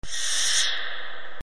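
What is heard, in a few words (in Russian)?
Похоже на ножи длинно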